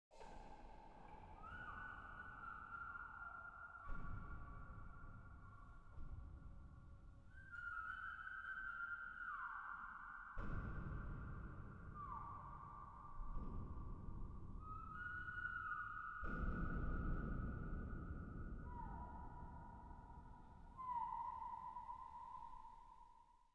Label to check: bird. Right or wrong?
Right